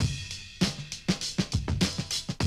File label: drum loop, 100 BPM